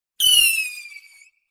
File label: Fireworks launch single tube mortar whistle scream